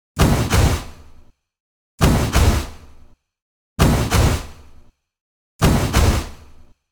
Boiler defense Idle

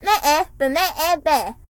deer long

